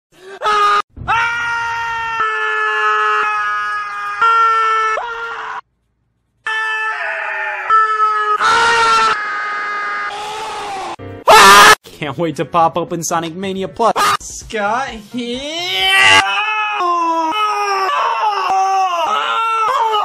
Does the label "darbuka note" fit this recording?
No